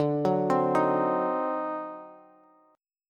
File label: D 7th Flat 9th D down Bass; D7b9 arpeggiated; JGuitar